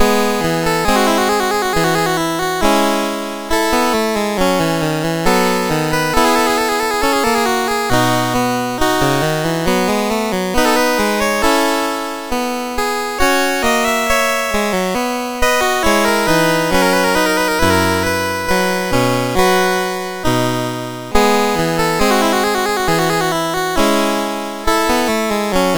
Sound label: Sarabande, MOS6581R4; Joseph A Grau; Sixth French Suite